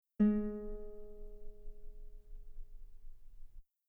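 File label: guitar G sharp 4 very long piano normal; bananenkeyboard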